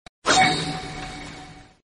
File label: jutsu notification